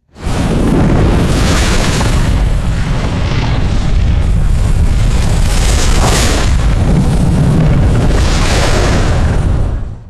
crackling fireball whooshing past

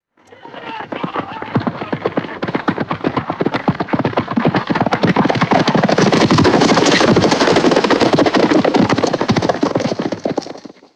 horses milling and galloping away on dirt; footsteps

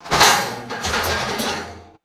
sharp metallic drawer jolt and rattle

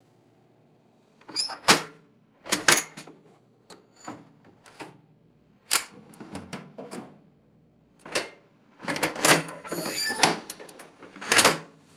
metal toolbox opening with squeaky hinge; tools

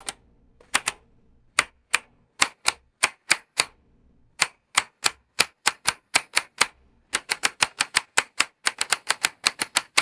plastic push button switch clicking; mechanical

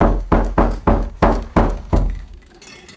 rapid knocks on wood and glass door; doors

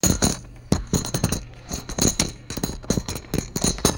gentle stacking of plastic poker chips; foley

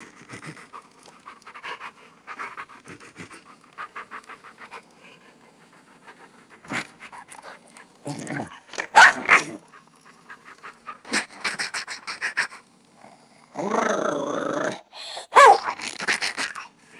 small dog panting and wet gagging; animals

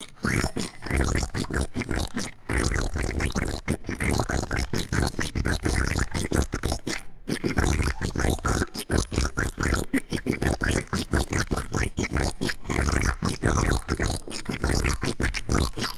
thick wet slurping and gurgling; liquid mud

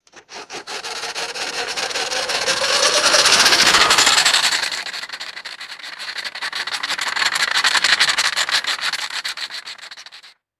plastic toy truck rolling away

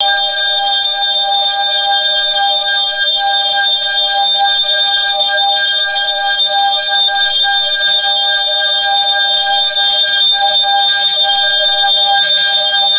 wavering flanged electronic sci fi tone

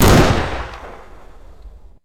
loud hyped cinematic gunshot

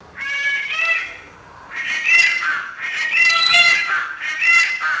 loud rhythmic peacock squawking calls; birds

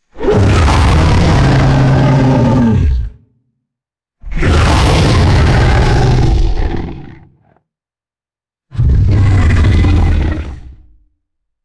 deep crackly creature roar

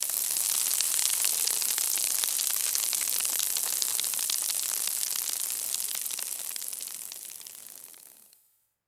dry dirt and debris pouring

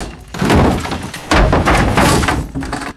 heavy wood crash with metal impacts

